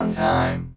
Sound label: perc voice, Roland MC-909